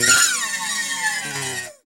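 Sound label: guitar scrape, Strats; PYB Strats; Clean E.Gtr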